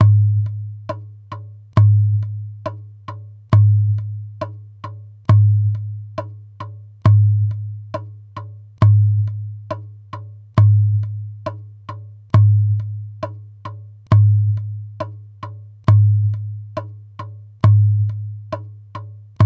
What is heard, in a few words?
Djembe 4 temps 68 bpm; Accompagnements Boogie